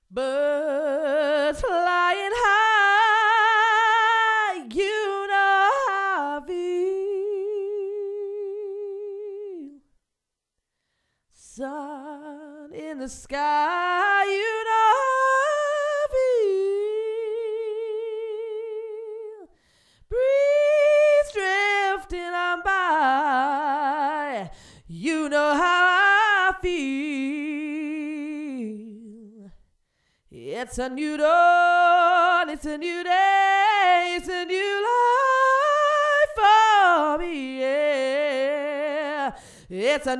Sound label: solo